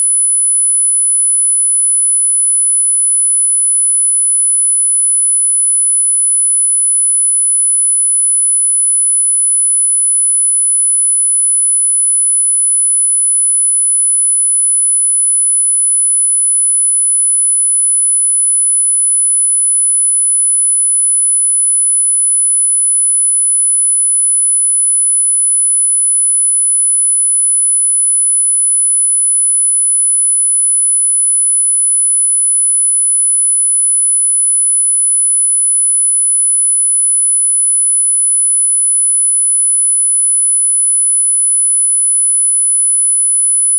10000Hz; Test Tones

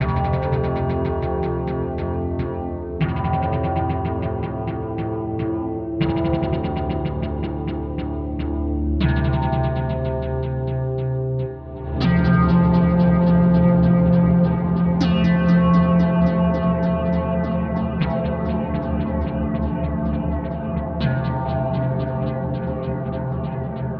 80 F Synth, Ambient Synth Loops; Aerosol

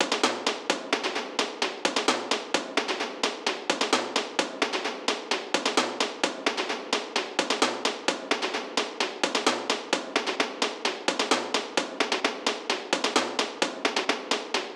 Drums Sacrificial Tension 130

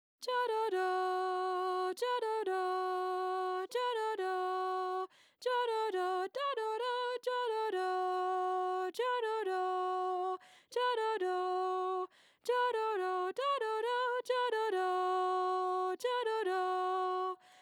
Alto

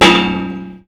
clang, tools Bangs

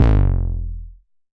808 BD long, Roland-808 Drum Hits Bass Drum

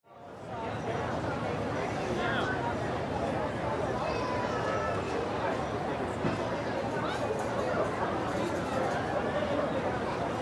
crowd

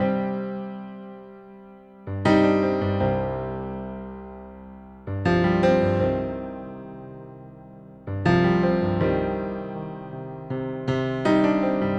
Emotional Piano

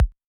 808 BD short, Roland-808 Drum Hits Bass Drum

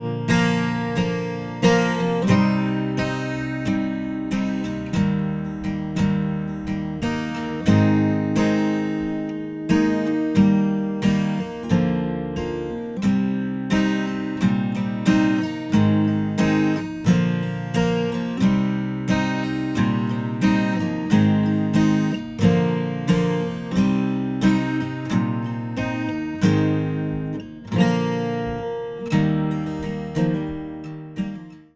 Acoustic Gtr

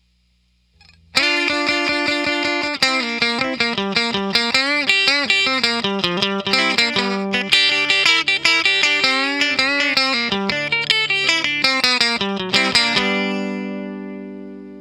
Lead Guitar

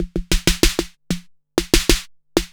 808 Loop SD 95, Roland 808 Drum Loops, 95bpm

